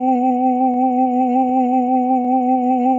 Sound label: ahh C